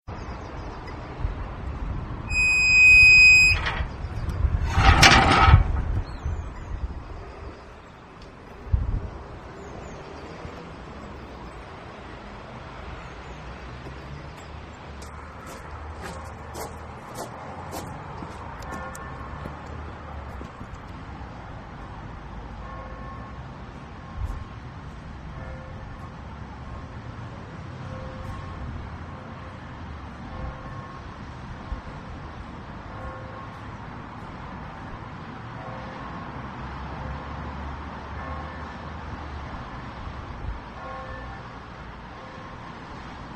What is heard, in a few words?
a soundscape from st canices